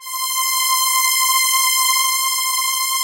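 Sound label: strings synth C5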